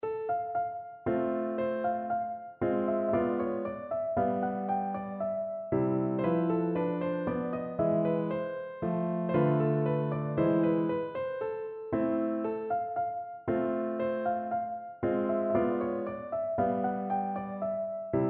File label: mazurka in C piano; glinka